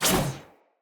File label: Sword Whoosh Heavy; Weapons; Swords; Garren Sword